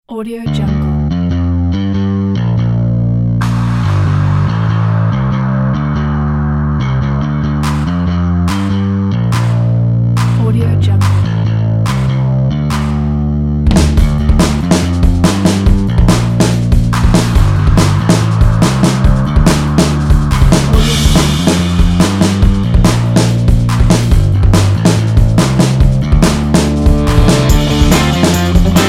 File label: garage indie rock